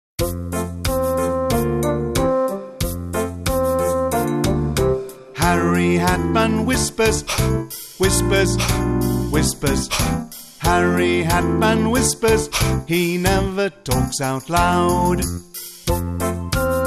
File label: ALPHABET SONGS; HARRY HAT MAN